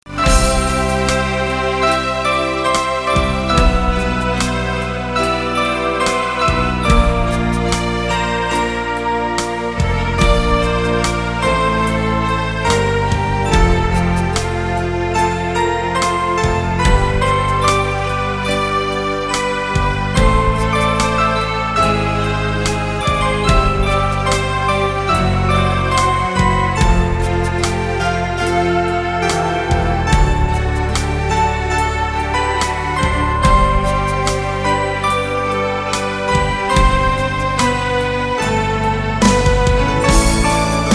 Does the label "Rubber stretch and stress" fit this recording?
No